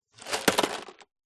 food drink empty soda cup just ice fall over fast food; zapsplat